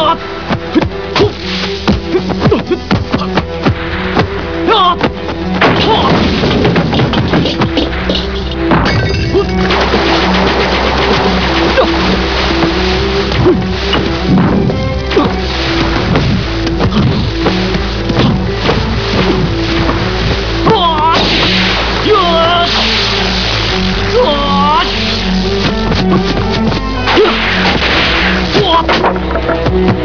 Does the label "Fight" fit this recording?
Yes